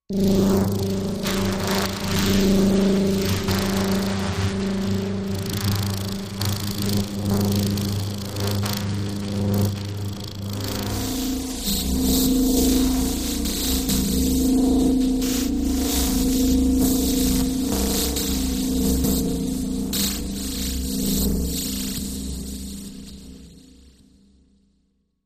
Butterfly Wing Bearin

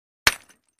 Brick Hit Hammer

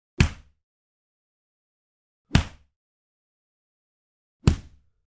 Body Punch Fast; punches kicks hits bones

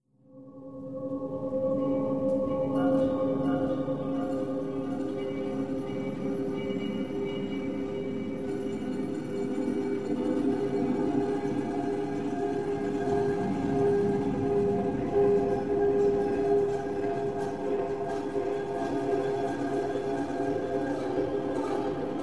Light Dist Tunnel, metallic

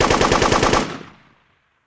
Machine Gun Single Rapid Fire Burst

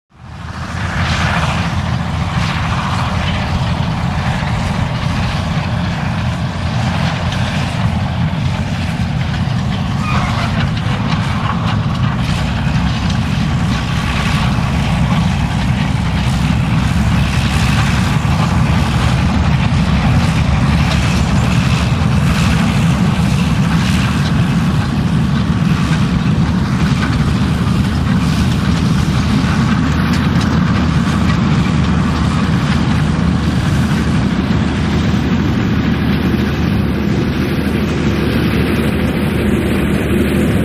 Away Diesel Train Pas

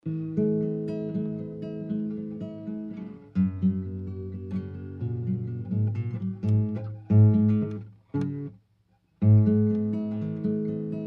Acoustic Guitar Play

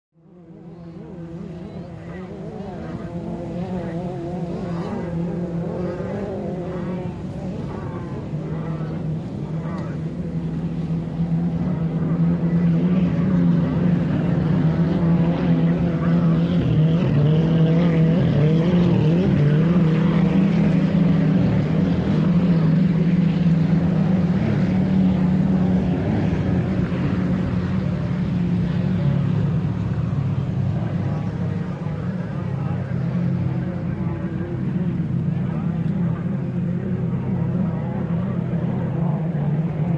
Boat Racing Amb, motorboats

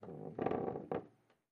Creaks Wooden Stairs

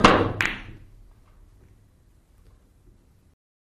Billiard Ball Bankin

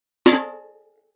Impact Metal Bucket; barrels buckets & cans

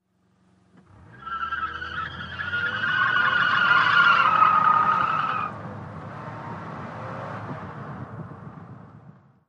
Sedan Midsz Peel Out

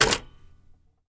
Typewriter Back Spa; typewriters

